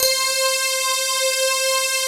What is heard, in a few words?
DYNATES, pads; Pro-Rec - Synthsonic